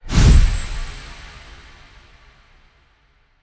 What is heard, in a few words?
Epic Accent; Seven Spirits